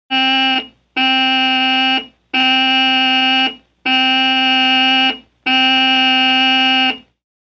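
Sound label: VEHICLES - HORNS 1930’S: Car horn, small.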